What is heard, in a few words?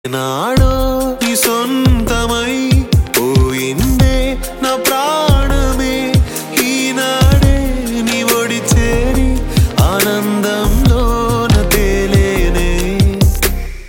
Love Ringtone